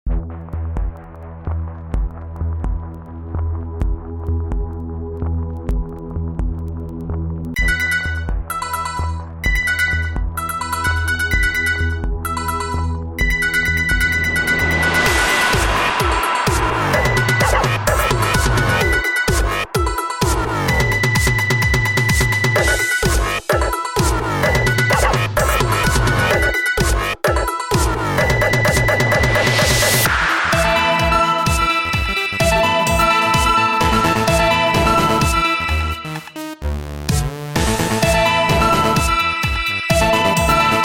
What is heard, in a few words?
Tone Drone